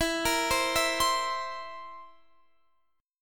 A sharp Suspended 2nd Flat 5th E

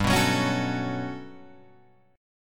G Major Sharp 11th G down Guitar Open G

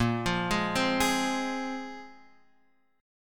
Ab 6th Bb; Ab6/Bb arpeggiated; JGuitar

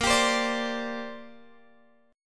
A sharp 6th Add 9th chord, Guitar, Open F tuning; A#6add9; strummed

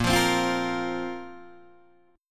Bb Suspended 2nd, Guitar, Open D

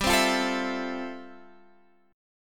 G 13th Suspended 4th G; G13sus strummed

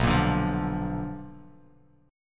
B Minor Major 7th Flat 5th Bass Standard; strummed; JGuitar

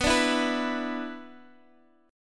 B-Minor Double Flat 5th, Guitar Open D

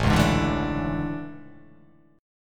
A Major 11th, down; AM11; strummed; JGuitar

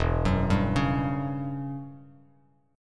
E Minor 6th Add 9th E down Bass Standard; Em6add9 arpeggiated; JGuitar

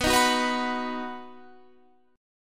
B-Major B down Guitar Standard; strummed; JGuitar

